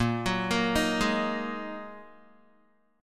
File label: Bb 7th Suspended 4th Sharp 5th, Guitar; Bb7sus4#5 arpeggiated; 6,9,8,8,8,x